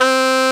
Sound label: sax; Greytsounds